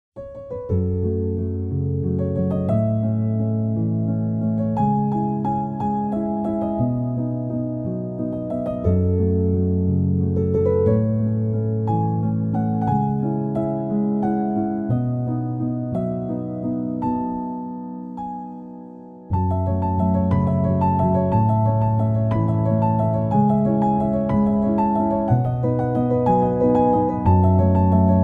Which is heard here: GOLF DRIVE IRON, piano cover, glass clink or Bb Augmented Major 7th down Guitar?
piano cover